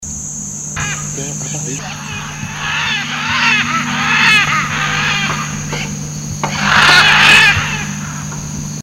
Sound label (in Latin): Ara ararauna; Silveira